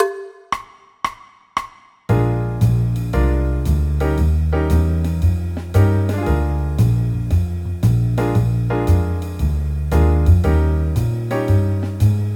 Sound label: horns backing track